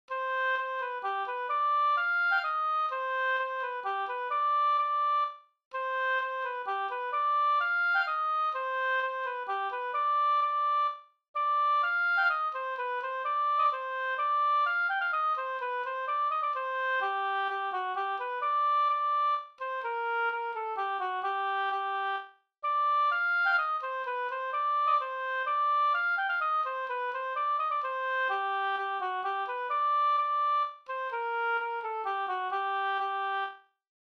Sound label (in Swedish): polska slängdans; Dybeck SvTonm